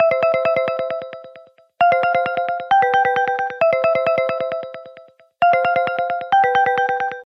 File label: nokia bell